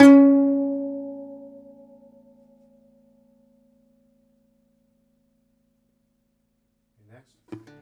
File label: DOMRA, Plucked Strings